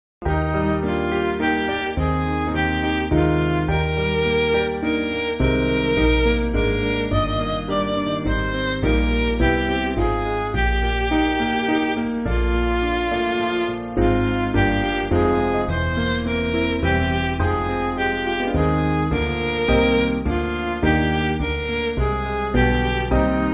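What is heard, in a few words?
Midi, Backing Files for Churches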